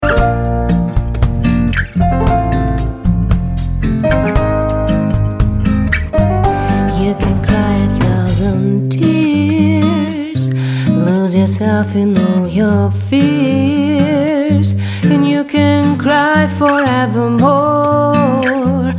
extra gesang

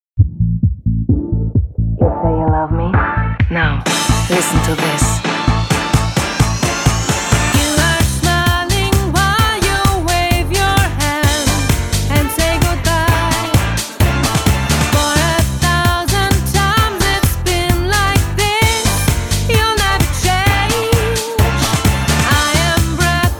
extra gesang

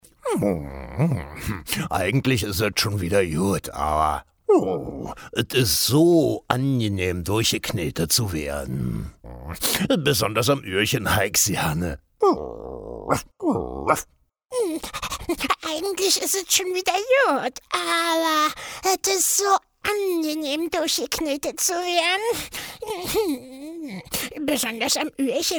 tierstimmen

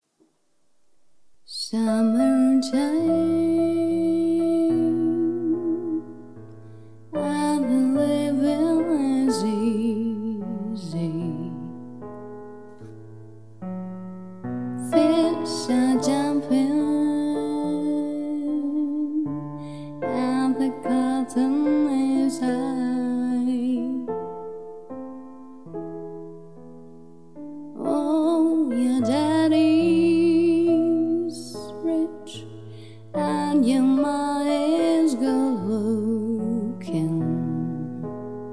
gesang

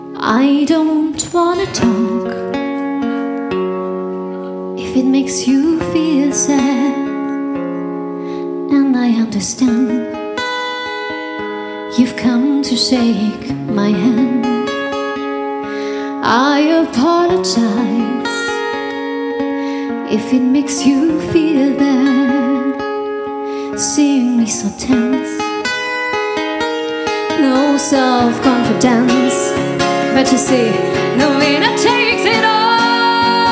Gesang live; Muttersprache Deutsch

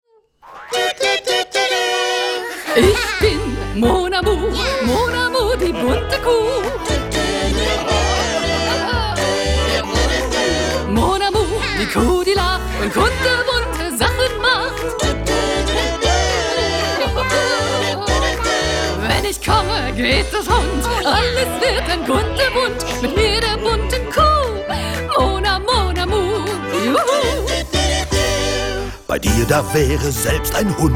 song

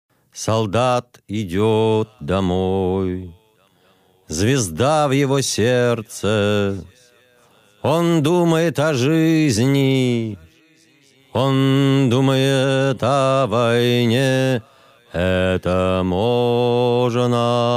gesang soldat

